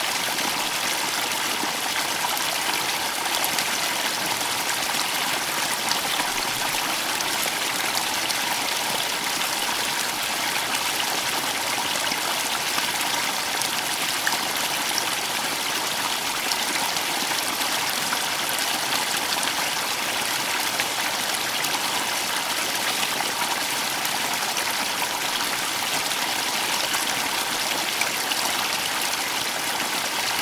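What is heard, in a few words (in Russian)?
негромкий водопад низвергающийся с высоты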